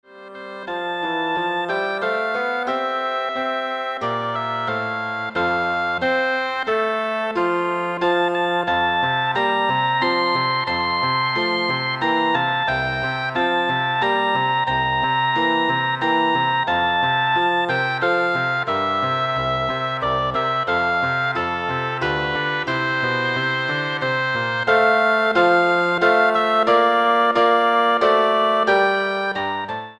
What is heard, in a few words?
Oboe Bassoon